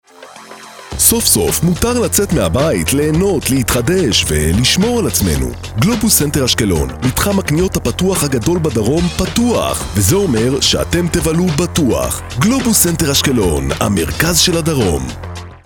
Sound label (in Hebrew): מוזיקה; גלובוס סנטר